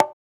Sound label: Percussion, House